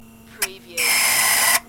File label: Stuck cassette player squeaking; beanstalk audio